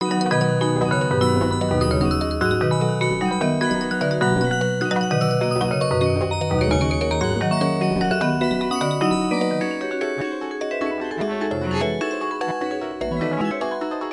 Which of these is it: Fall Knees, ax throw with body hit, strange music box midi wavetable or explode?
strange music box midi wavetable